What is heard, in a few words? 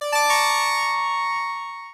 game start; horizontalrunner